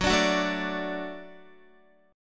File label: Gb Minor Sharp 5th Gb Guitar; strummed; JGuitar